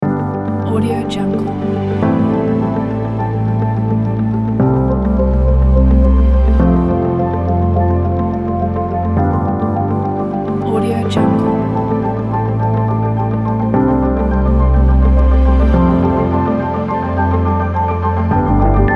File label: ambient documental